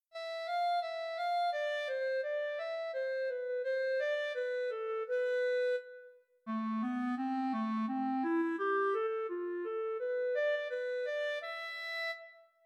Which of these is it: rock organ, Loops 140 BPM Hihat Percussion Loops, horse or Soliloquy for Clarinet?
Soliloquy for Clarinet